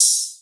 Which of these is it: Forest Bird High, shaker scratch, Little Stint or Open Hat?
Open Hat